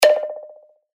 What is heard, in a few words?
Flamingo, percussion; revealed percussion vol 2